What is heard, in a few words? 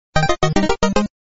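famicom, 着信音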